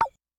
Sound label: Obstacle Snowball Turnoff; In Obstacle Snowball Turnoff